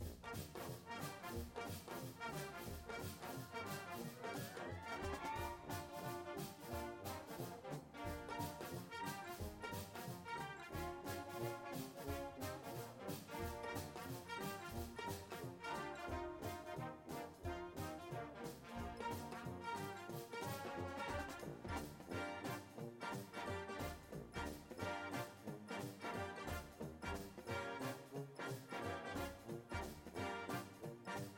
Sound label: cymbals w music